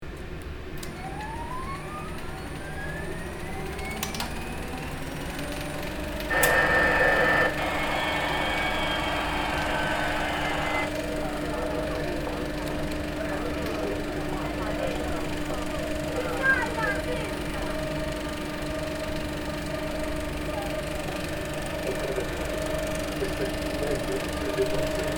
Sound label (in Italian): Proiettore